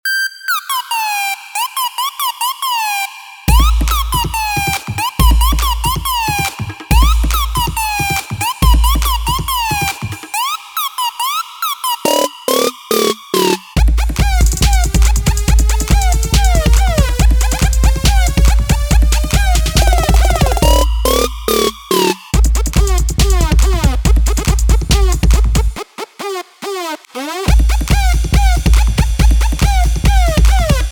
Magic Slide Whistle

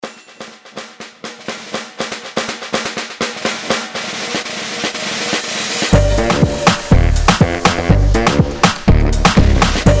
Hornier Loop

